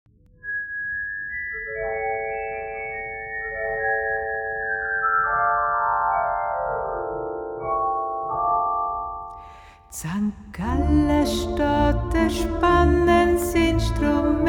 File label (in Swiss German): E spannends instrument